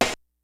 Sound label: snares; Kid Nepro; Elite; KICKS SNARES